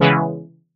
Synth CMin; Unit Rcrds

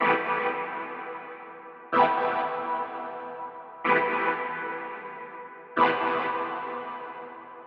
Synth Loop 125 bpm CMaj; Unit Rcrds

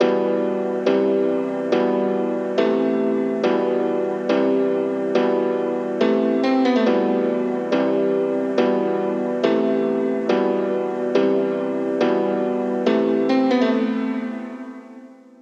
Drill

